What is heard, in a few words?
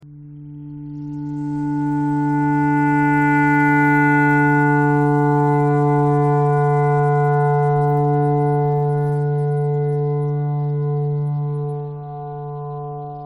clarinet thread noise